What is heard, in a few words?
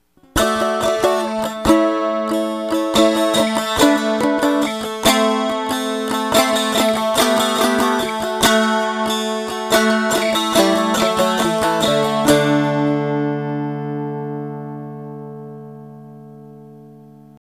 cardboard dulcimer